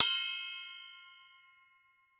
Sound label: Cartoon Bell Hit